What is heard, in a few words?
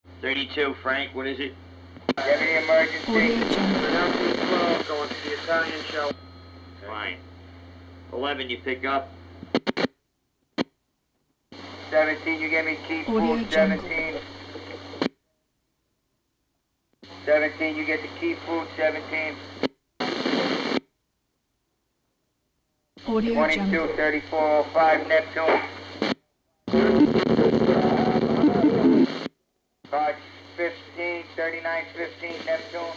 new york city taxi radio